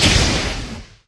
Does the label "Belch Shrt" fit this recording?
No